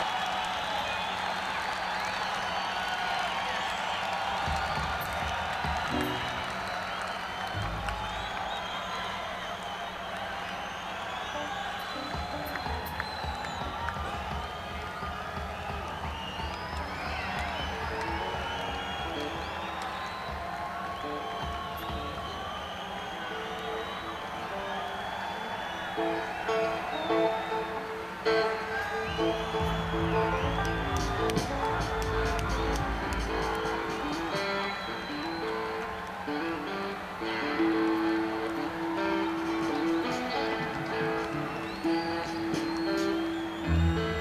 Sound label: Crowd